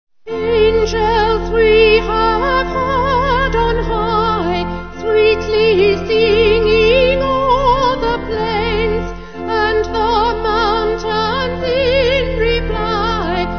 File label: Vocals Organ